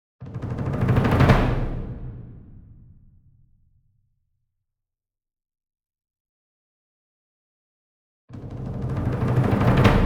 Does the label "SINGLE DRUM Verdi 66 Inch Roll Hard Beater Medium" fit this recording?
Yes